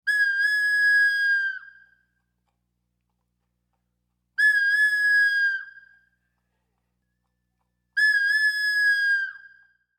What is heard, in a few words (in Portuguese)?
CABEÇA DE FLAUTA